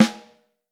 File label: Snare Drum; Q Up Arts; Johnny.C's Vintage Snare Drum; 5.5X14